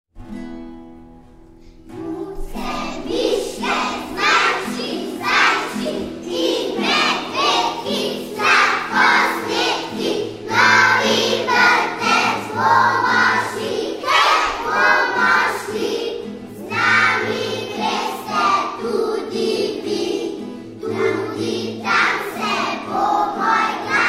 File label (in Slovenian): himna vodiških cicibanov